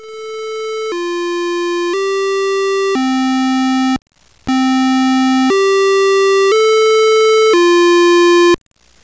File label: all clear